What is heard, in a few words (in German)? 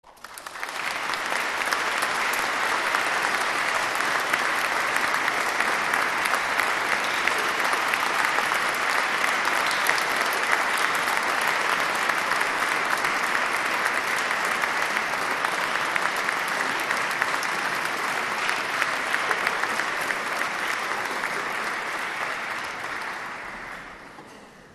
Applaus